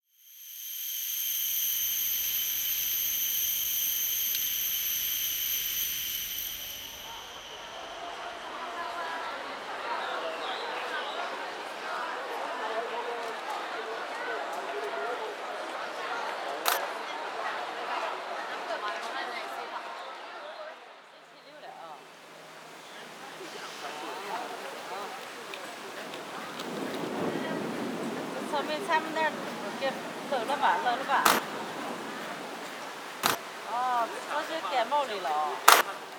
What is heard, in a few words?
soundscapes combined